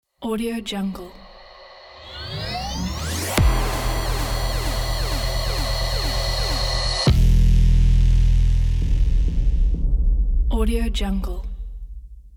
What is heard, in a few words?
futuristic logo